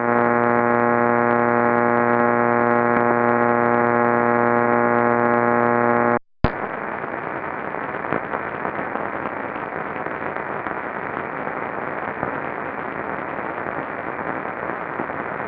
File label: VLF 13k AM 60Hz Comb